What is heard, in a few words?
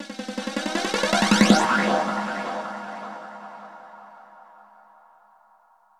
drum loop, ambient, 160; eJay